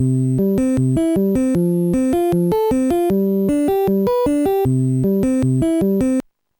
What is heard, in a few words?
arpeggio; Sonix Music Driver